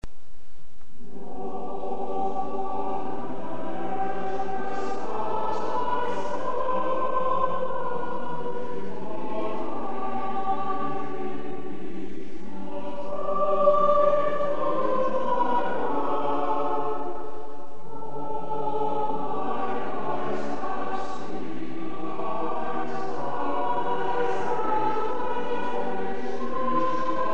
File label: Nunc dimittis; Gibbons Short Service